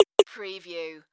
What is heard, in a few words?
Multimedia Percussion